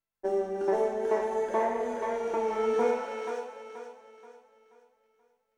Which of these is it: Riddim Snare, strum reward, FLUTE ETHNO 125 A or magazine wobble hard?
FLUTE ETHNO 125 A